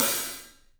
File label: PAISTE HAT, Drums; 3D Sound